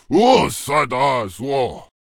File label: Samurai Giant Attack Vocals